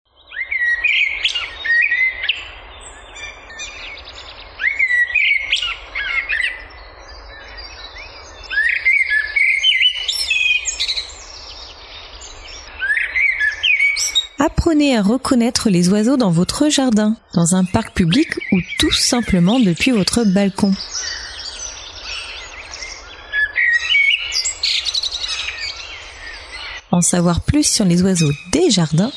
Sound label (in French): La chouette effraie ou chouette des clochers; Damien Drillet